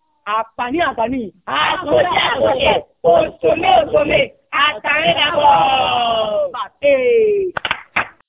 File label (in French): chants; akpani akpani; chants d'introduction